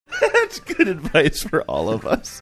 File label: laughing saying thats good advice for all of us